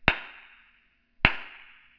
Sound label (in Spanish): dos palmadas; negras; primartis